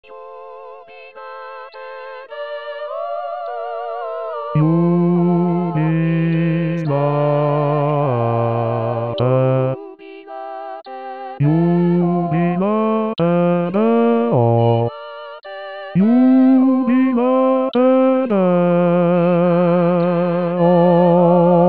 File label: Jubilate Deo bassi; Bartolucci; corali in vaticano 2024 voci guida bassi